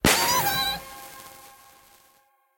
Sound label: wild Spawn; finn